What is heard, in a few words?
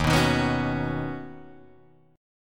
Eb Minor 7th Sharp 5th, Guitar DADGAD